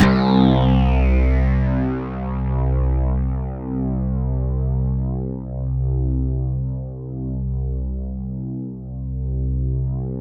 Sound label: C2, Synth Line; 90 s Sample CDs; NiceBeats; SynthLine VOL-27